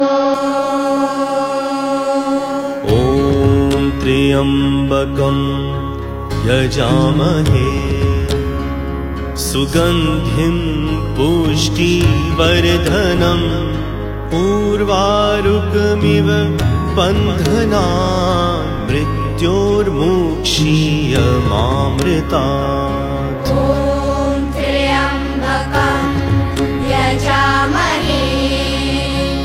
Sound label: Mahamrityunjaya Mantra